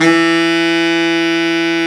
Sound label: BARITON SAX